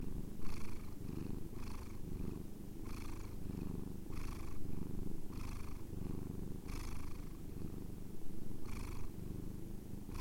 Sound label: Purring Sniff; Kata